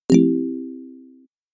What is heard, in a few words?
completed